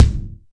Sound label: loud kick single hit c sharp key, kick drum; kick drum samples